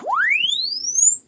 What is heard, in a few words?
Press Two Sides Chirp; Samsung 4 Recordings